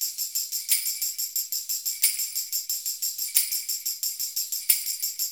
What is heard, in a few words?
90 MIX, Percussion Loops